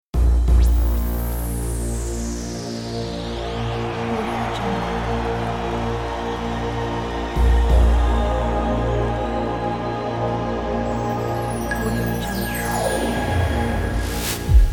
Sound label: startup logo; alivestone